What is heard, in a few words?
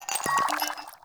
Vial Foley J; Warframe; Lasrian; Lasrian Soldiers; Footsteps; Lasrian Goonadier